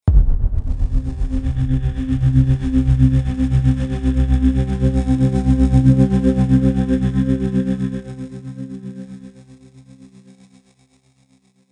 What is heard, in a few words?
Pulsating Fear Trailer Hit